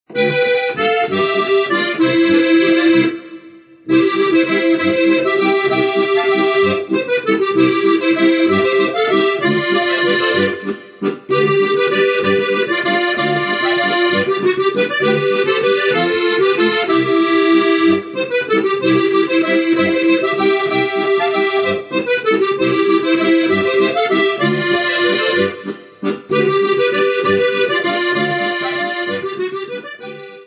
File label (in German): Walzer